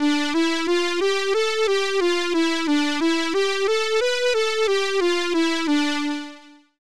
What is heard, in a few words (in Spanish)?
sonido clase midi